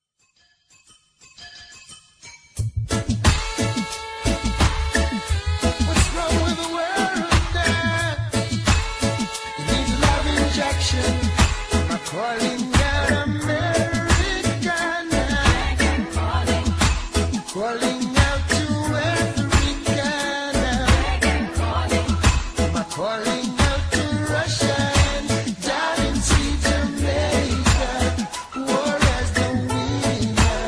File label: reggae calling reggae